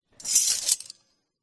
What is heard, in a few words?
metal silverware gear twist gear open slide texture; ancient game